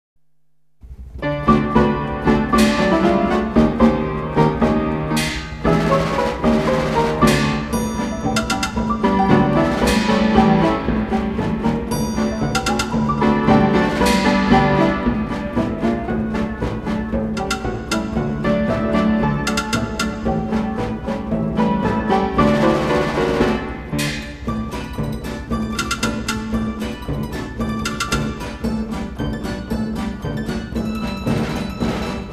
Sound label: jazz band